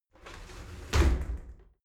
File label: shower slide doors close regular; objekte; tueren